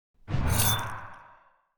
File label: Shift Strike